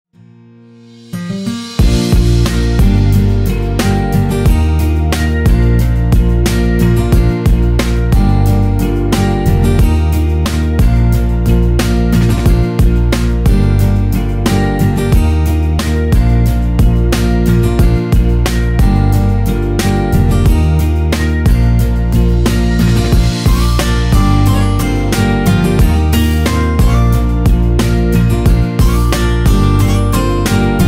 instrumental

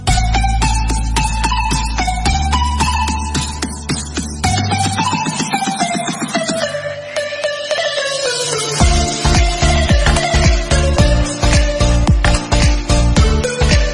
instrumental ringtone

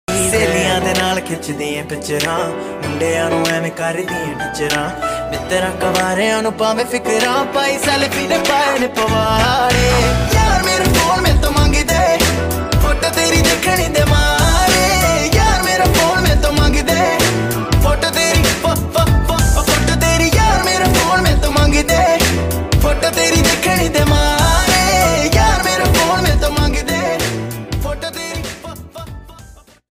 Punjabi

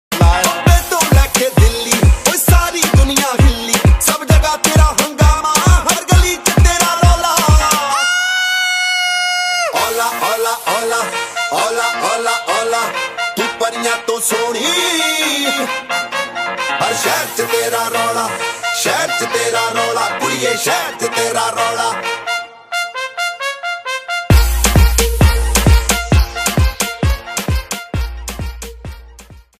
Punjabi

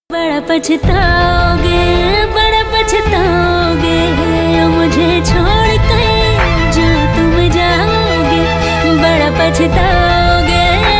female ringtone

